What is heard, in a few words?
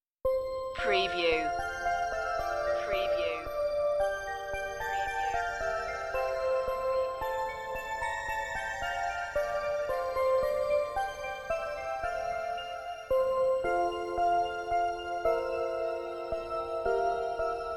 we wish you a merry christmas bells keyboards